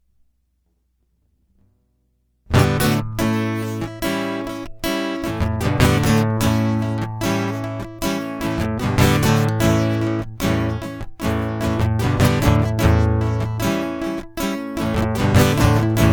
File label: Guitar Loop B; hyper terrestrial